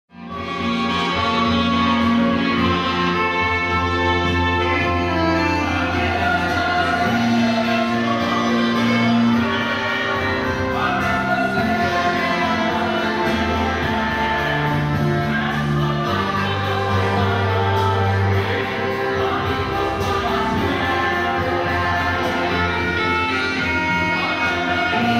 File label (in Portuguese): CORAL E ORQUESTRA